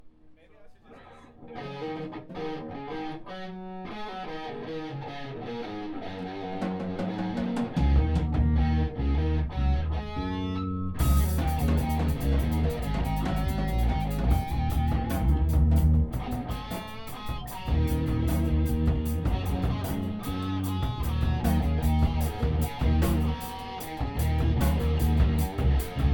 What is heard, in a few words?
noise